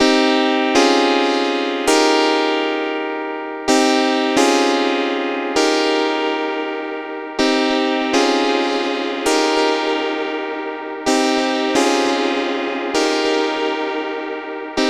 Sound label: synth stabs; septimbral